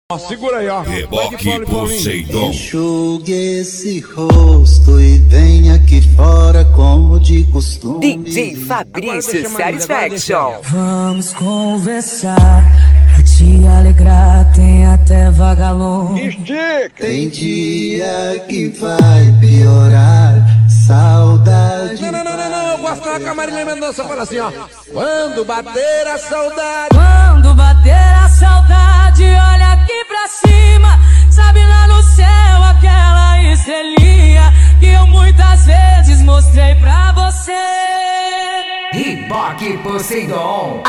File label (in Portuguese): sertanejo; reboque poseidon e sartori sound car